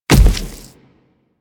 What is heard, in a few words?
Splitter Step Default, Footsteps; Warframe; Infested; Grey Strain